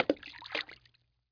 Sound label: ball landing water